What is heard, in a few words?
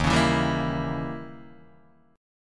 D sharp 7th Flat 9th, D sharp, down, Guitar, Open D